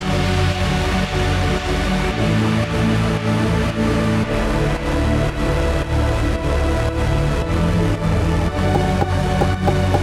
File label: UPLIFTING TRANCE remix